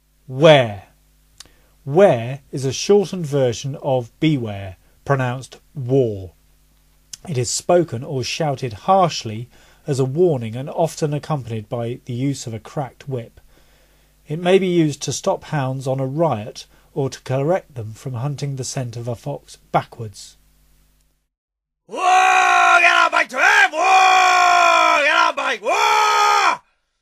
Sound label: "ware", calls